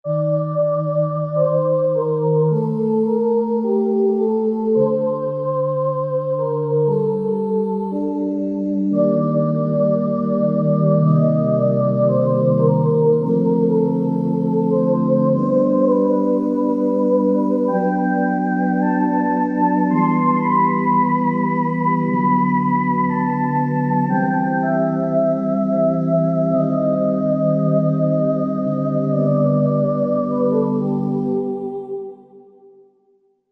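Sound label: Stereo Voice; Korg MSC-1S Variety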